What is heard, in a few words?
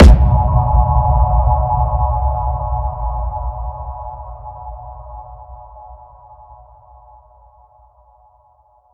impact submarine; Virtual Riot